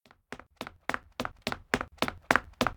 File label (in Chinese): 一路小跑; 环境音; 非循环音(SE); Eustia